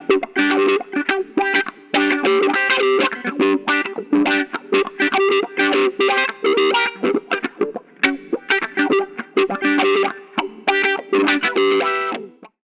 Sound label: Zener Limiter GUITAR COMP; Chandler; TG12413